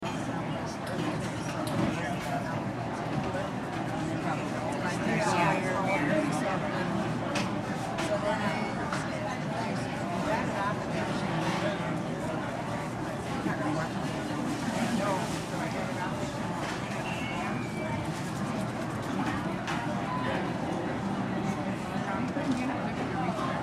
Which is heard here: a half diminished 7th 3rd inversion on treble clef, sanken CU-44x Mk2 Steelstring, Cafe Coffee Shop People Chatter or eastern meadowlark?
Cafe Coffee Shop People Chatter